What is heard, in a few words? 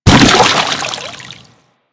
Bodyfall Water; Warframe; Kubrow; Bodyfalls